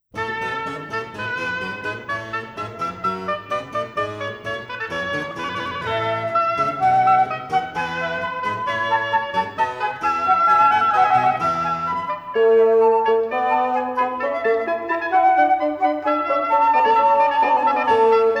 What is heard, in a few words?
Quartet in A; Telemann; TWV42-a2